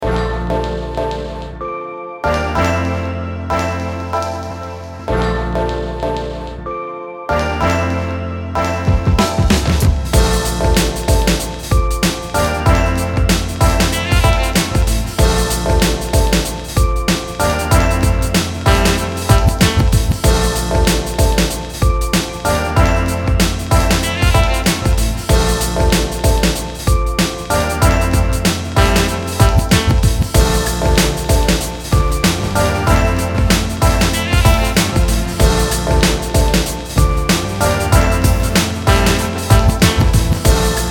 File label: MODERN URBAN